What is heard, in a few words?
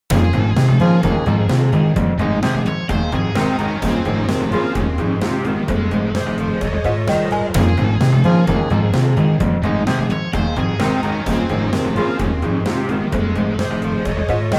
Hit The Bass